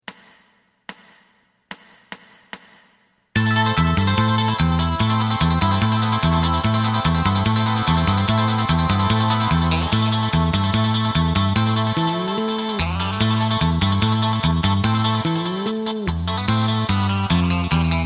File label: drum